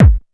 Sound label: dance 1, bassdrum; Ueberschall; Techno Trance Essentials; DRUMS; BD DANCE1